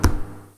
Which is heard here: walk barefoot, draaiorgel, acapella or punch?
walk barefoot